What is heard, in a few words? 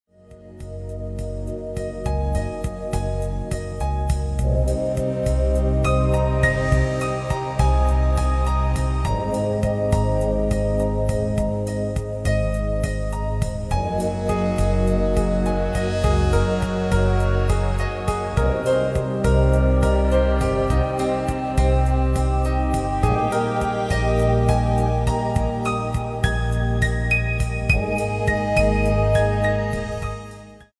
light jazz